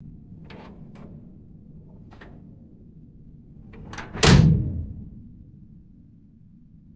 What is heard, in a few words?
heavy metal submarine bulkhead door closing; boats